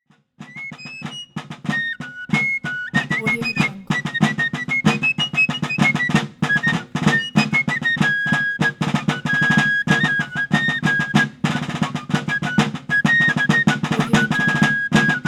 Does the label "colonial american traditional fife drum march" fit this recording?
Yes